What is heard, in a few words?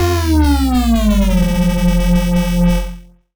BASS, "(F)"; neuro; Stanza